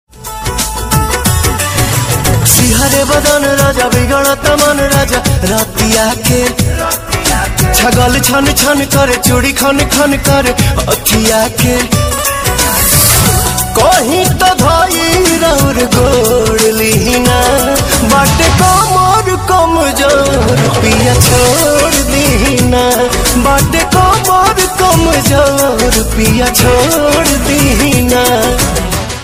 bhojpuri new song

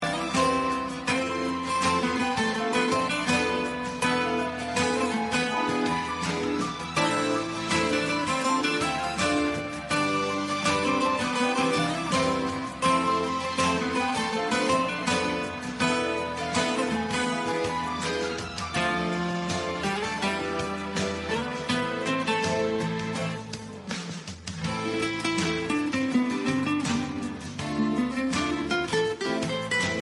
Background Music

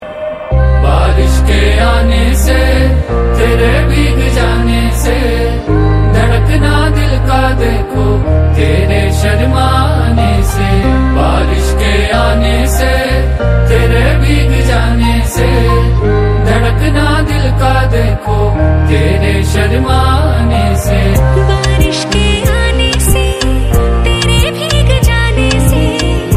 trending song

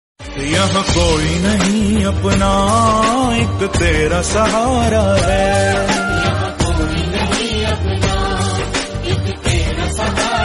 Bhakti

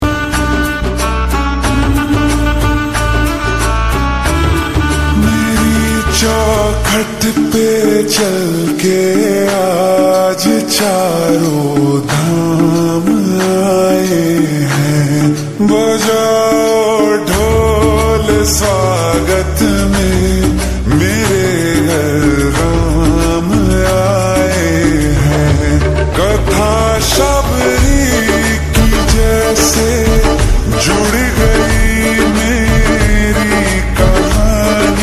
slowed reverb lofi song

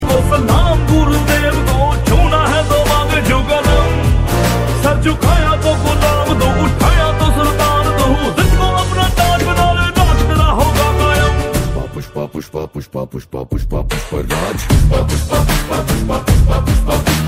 trending music; Pushpa

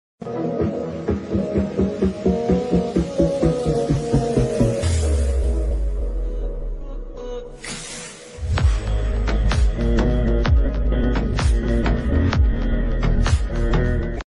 Attitude BGM

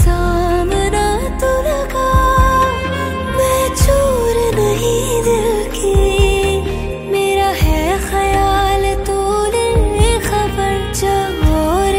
Female Version

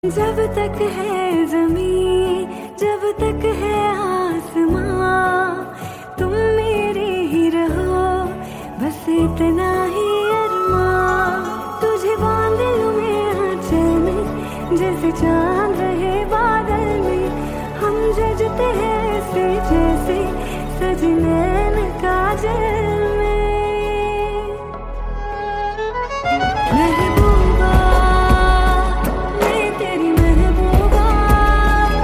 Romantic Song; KGF Chapter

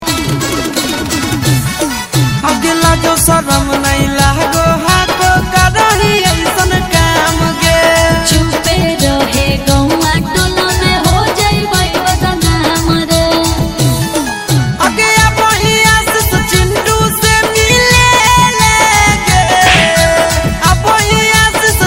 maghi song

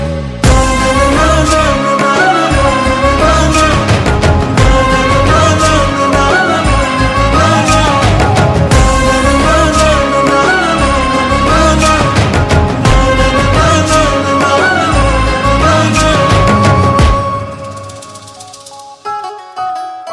song BGM

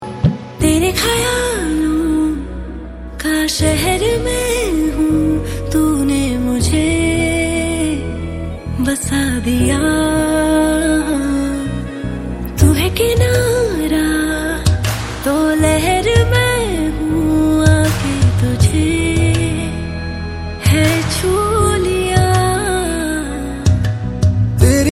song

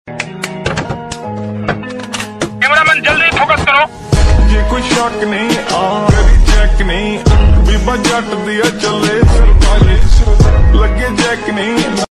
female version

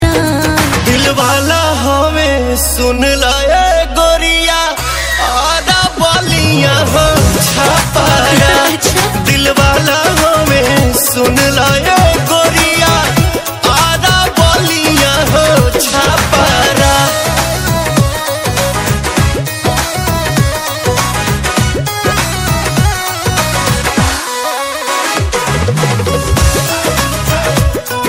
Song

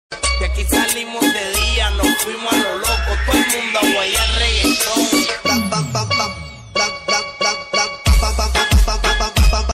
Instagram Trending Remix DJ Song

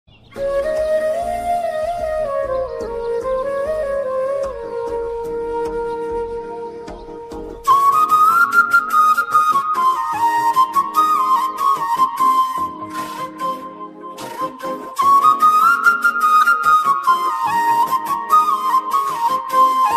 Instrumental New Tamil Song

